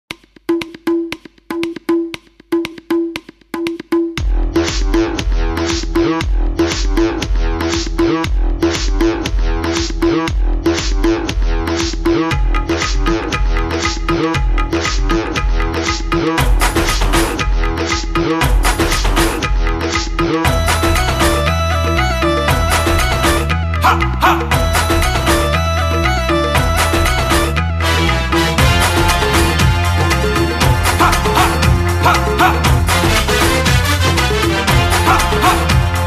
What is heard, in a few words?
Tabla Beat; Balma